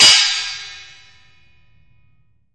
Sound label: METAL PIPE HIT